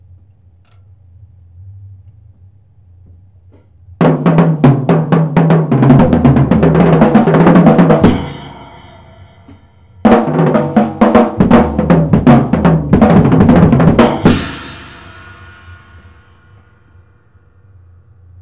break 120, acoustic

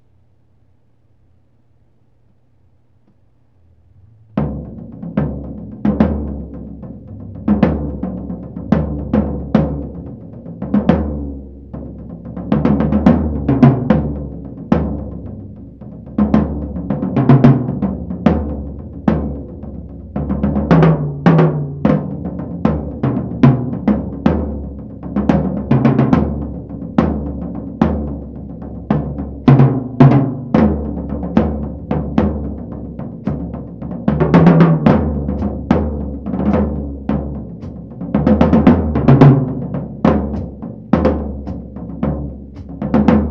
nippon pattern 110, acoustic

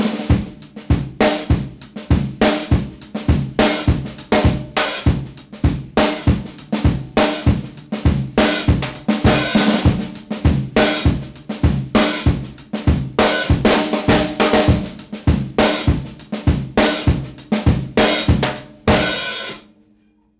beat 100, acoustic

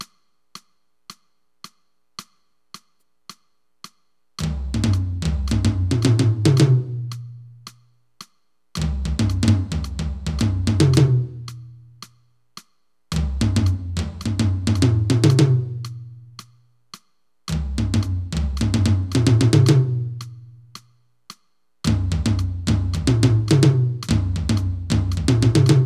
tomtom pattern 110

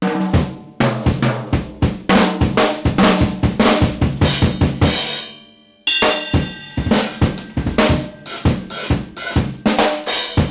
break 100, acoustic

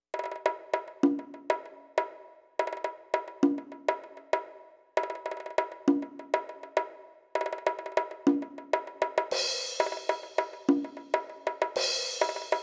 perc 100

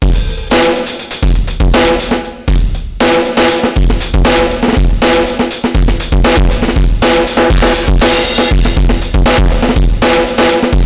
heavy killer beat 120

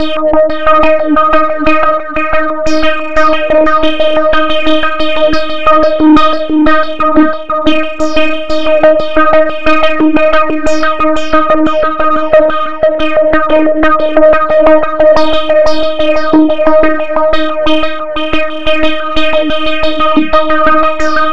acid wall 90

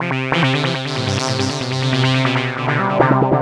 140 loop

